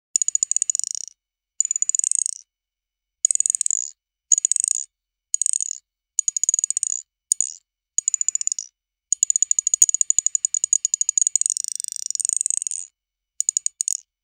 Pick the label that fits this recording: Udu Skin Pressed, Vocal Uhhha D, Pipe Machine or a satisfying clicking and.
a satisfying clicking and